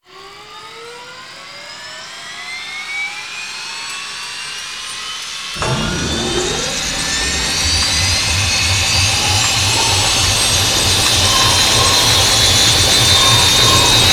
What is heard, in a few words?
ambience prime laser startup